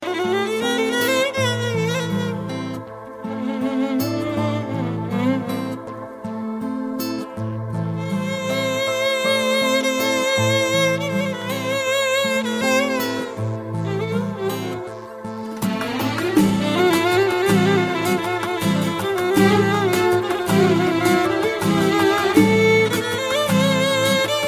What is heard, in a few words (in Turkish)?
Keman